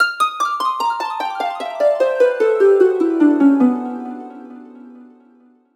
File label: MUSICAL HARP DESCEND